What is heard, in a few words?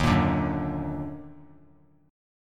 D sharp Minor Major 7th; strummed